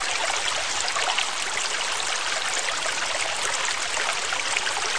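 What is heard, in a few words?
ambience small waterfall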